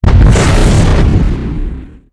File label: swarmer missile fire; weapons; Stellaris